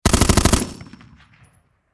Warfare Machine Gun Springfield M 14 Long Burst Close Perspective